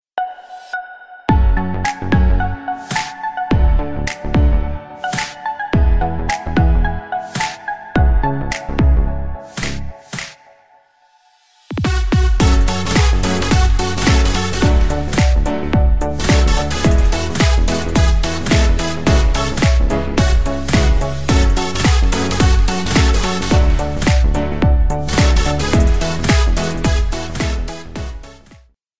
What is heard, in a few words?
trap remix